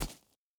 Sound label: boar footstep dirt hard; Rust